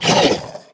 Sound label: Monster Attack